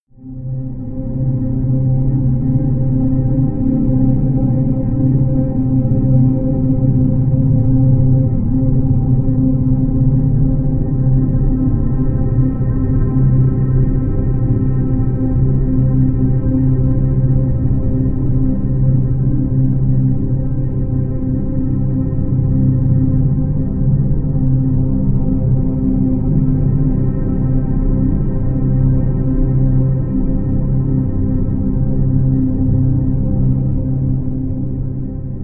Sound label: drone dark crows